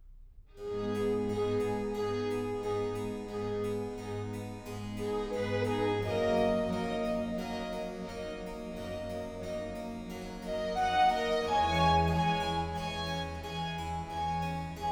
Concerto in A minor, Larghetto, flauto traversiere; Antonio Vivaldi (1678 - 1741); Vivaldi - Concerti per flauto traversiere (2002)